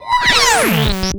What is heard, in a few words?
Roland C Noise, Roland S100